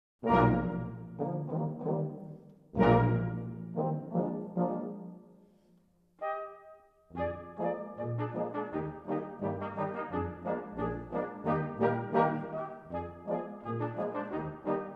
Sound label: pizzicato